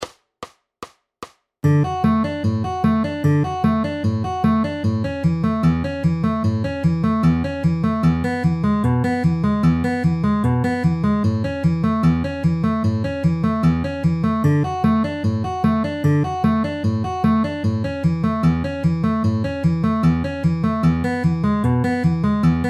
D A E A outer inner middle middle with alternating bass at 150 bpm